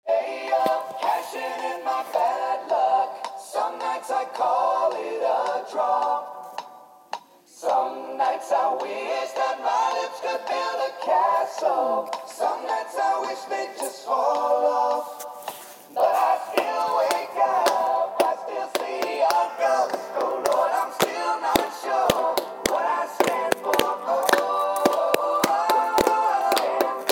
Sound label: group rhythm piece